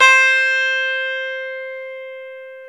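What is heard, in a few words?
E 12 String guitar; Club-50 - Foundations Roland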